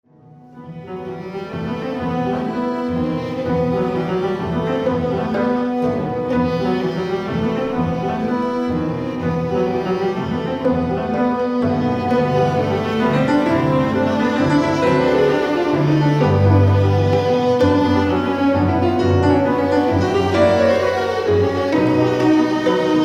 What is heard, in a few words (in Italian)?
Quintetto per pianoforte, due violini, viola e violoncello Scherzo Allegro; Alessandro Longo